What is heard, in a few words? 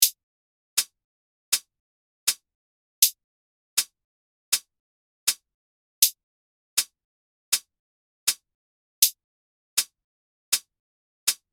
Hi hat metronome 80 bpm